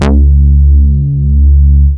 Ultimate Bass Root C#; Vengeance Samples

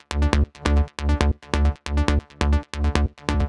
137BPM Bassline WET, Dminor

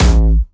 A1, Jumpstyle Kick; Bassdrums; Jumpstyle Multis; vengeance essential club sounds-3; VEC3 Bassdrums

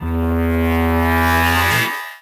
Synths E; vengeance essential club sounds-3

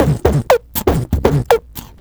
Beatbox Loops, Human Beatbox

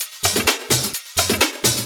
Houseloops 128BPM; Vengeance Samples

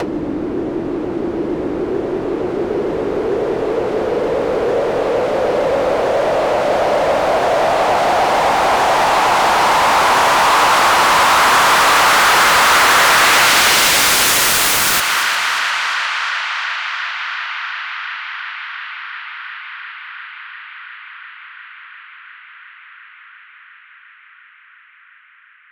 FX Uplifter

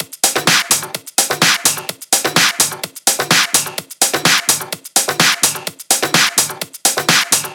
127BPM Drums; Vengeance Samples; Rebound Kit

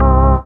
Bass C, Offbeat Bass

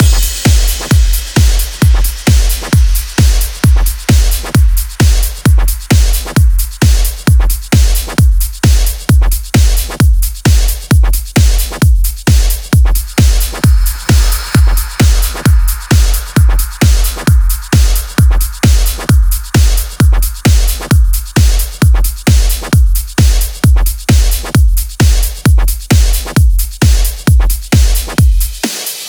132BPM Drum Mix 16bars; Drums & Perc; G#major

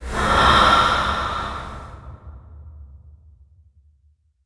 VFX Atmos

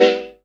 Synth Shots; Vengeance Samples

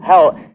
LoFi Vocals Oneshots; Vengeance Samples

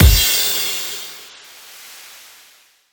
Impacts

